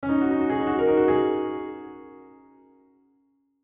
Piano Fill Mysterious; Musical; Pianos; Blastwave; Sound Effects:Musical

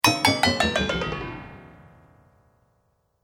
Metal Magic Down; Magic Sound Effects

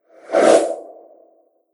Swoosh Whoosh

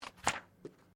Book Page Heavy Flip; Book movement; Books (Sound Effects:Offices)

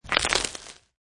Snow And Ice Ice Cracking; also great as a bone break, breaking bones sound; Weather

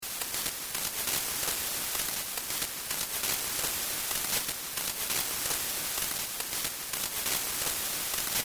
static hiss; Static Hiss Hum Fuzz Long; Production Element Imaging Element Accent Transition; Blastwave; Static Sound Effects

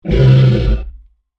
Dinosaur Snarl, Creature; Animal; Animals; BlastWave

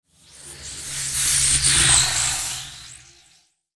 Sci Fi Energy Beam Pass By Zap Electricity Bolt; Laser; Lasers

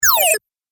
Arcade Game Magic Drop Sound; Video Game Sound Effects; Retro Inspired; Think Nintendo, Atari, C64, Etc.

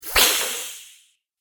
Vampire Hiss; Monster Sound Effects; Horror Sound Effect; Andrew Fleming

